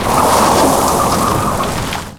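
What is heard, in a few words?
power slide; XBOX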